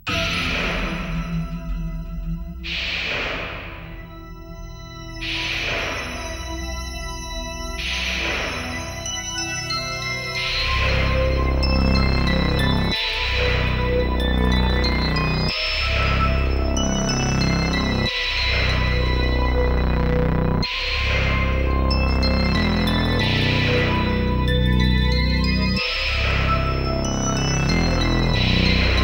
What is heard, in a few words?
Dramatic Tune; Inferno The Odyssey Continues